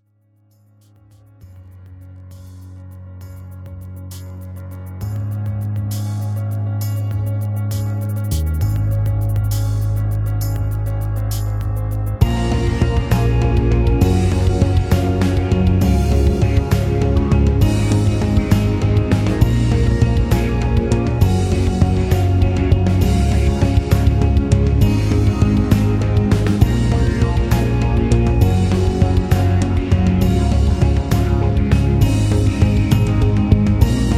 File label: rhythm